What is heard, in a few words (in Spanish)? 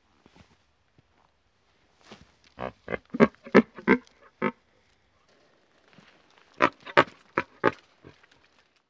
Cervus elaphus - Ciervo rojo